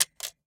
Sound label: Hair Dryer Switch, Broken Hair Dryer; InMotionAudio